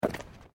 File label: Footsteps Boots Ceramic Paving Tiles Step